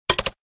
UI Page

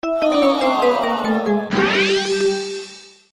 sound car lose

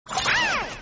captain parrot

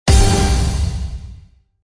Scatter Third; hockey

